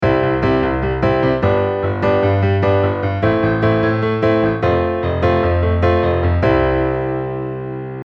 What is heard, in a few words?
8 beat